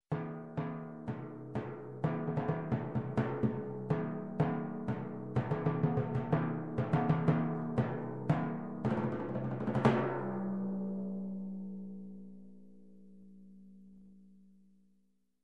Timpani Rhythm

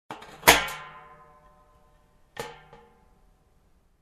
Cookware top bang sound

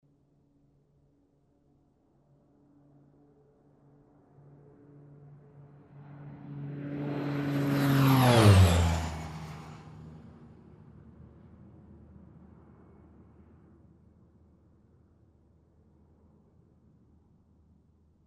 Light Aircraft ground roll whirring sound

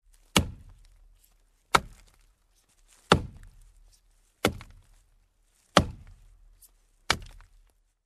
Chopping Wood

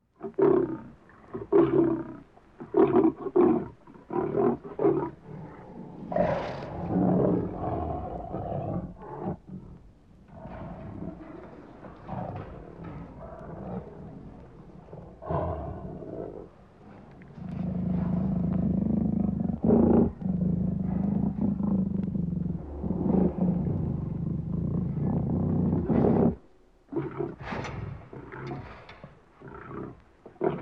Miscellaneous bellowing noises

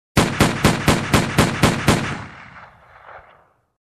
Multiple gun fires

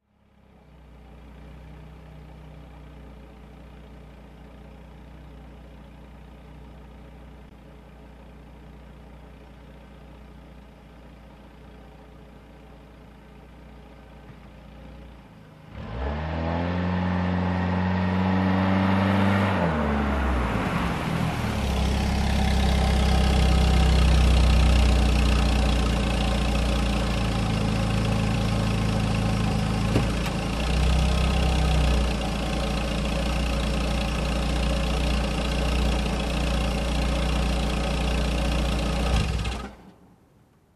Car approaching and parking

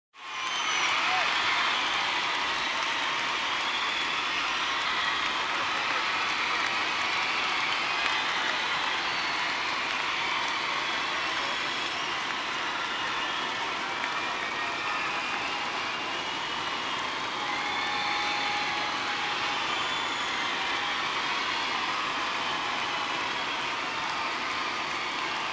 Crowd in a concert cheering and clapping

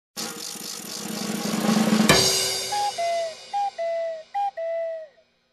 Comedy Punchline drumroll

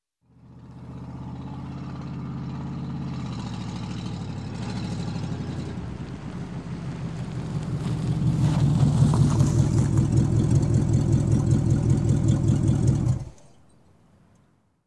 Old Car parking nearby

